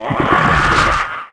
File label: arachnid screech; cstrike